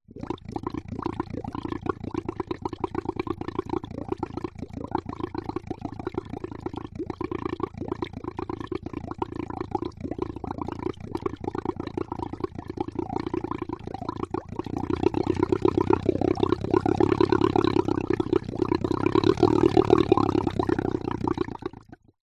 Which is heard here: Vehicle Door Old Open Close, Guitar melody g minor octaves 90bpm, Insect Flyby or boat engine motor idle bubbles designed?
boat engine motor idle bubbles designed